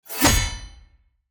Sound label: sword hit; Shelter